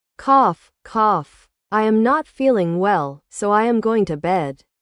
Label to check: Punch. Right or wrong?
Wrong